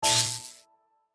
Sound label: Sparkling Bomb Destroying; my cute commissar 2020 gamerip